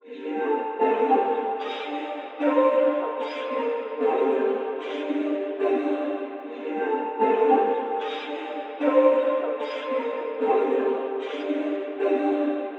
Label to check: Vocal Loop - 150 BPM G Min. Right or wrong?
Right